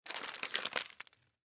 Food Peanut Bag Plastic Small Crumple Pick Up Cement; Foley